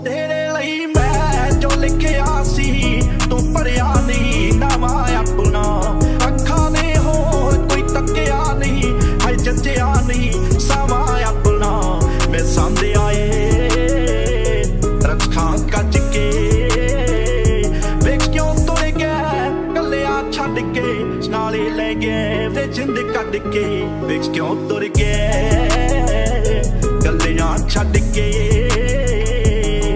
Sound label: PUNJABI RINGTONE